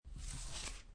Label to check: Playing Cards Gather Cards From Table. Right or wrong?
Right